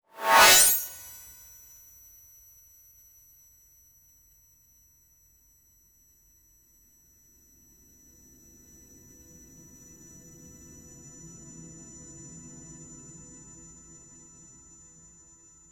short elegant golden shim; brand

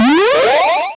create an 8 bit power up, power up

